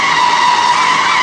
SQUEAL, SURFACES; ImmersionRacing

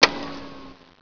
Switch Off; Simple Diary 1.3.0

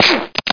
dog

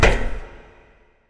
foot metal; Earth and Beyond; EA Games

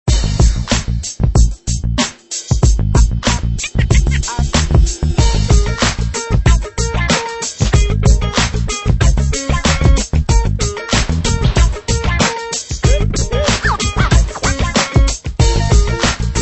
music In Game; scoobybigair

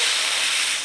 copy of panel in; The Rift

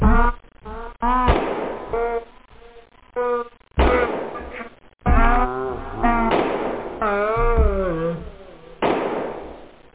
vocal; OctaMED SoundStudio V1

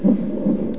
BEAT

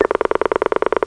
dialing; WAV Sound Effects; houshold; clokphon

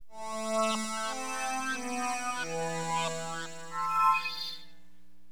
FUZ PAD, Keyboards; HipHop; LiveAct Pool 1

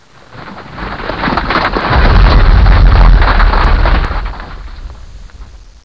snow rock

